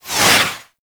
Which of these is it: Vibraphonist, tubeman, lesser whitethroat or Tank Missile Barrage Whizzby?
Tank Missile Barrage Whizzby